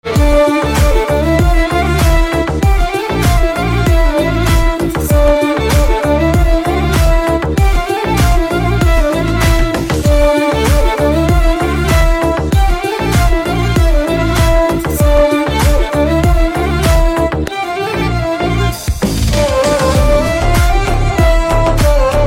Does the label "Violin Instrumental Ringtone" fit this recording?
Yes